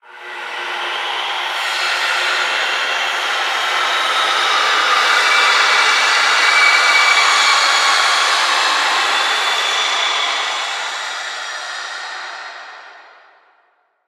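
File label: Workshop Saw Distant; Prison Architect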